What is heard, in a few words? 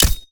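dark katana, impact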